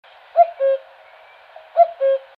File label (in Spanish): cuco